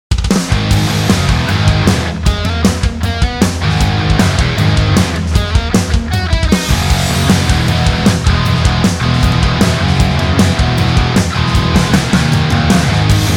Huge Rock Drums Dry; SDC

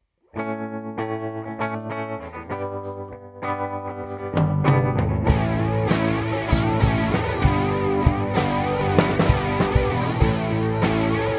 Pedal Song, raw (un-mixed, no reverbs) song; showcases almost all the pedals in the bundle: MissTress, C2, P90, UV, SHOD, Carbon; PedalBench bundle

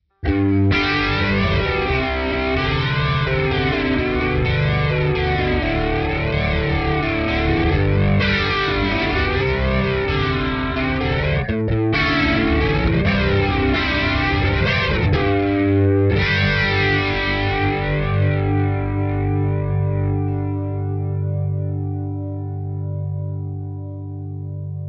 Rock TwinR Overdrive Flanger, guitar; Rock – TwinR – SHOD Overdrive – MissTress Flanger; ReTube Custom Shop

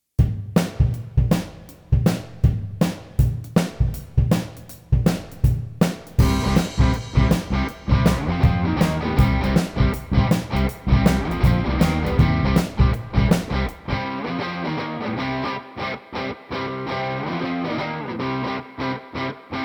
Rhythm Guitar

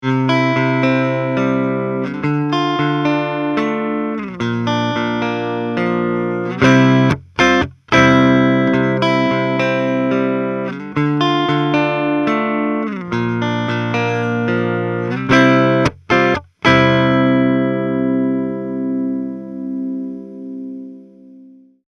Pan below

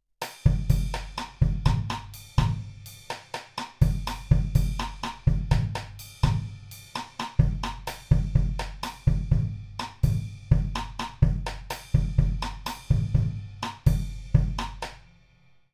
Groove, drum-sets

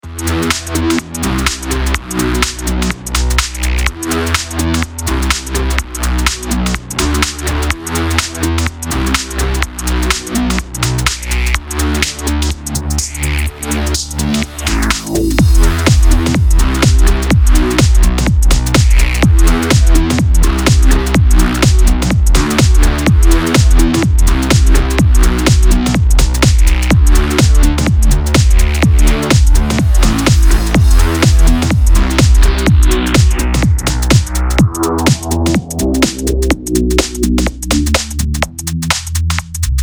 Drums DRY; Xtrim

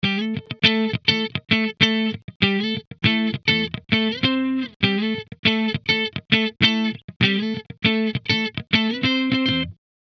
Funk Guitar WET

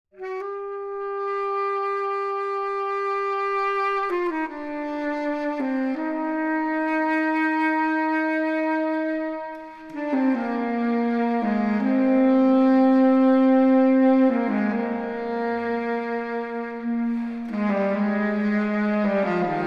Sax Large Dense; Sax dense room; Simply a plate reverb.; Verbtone

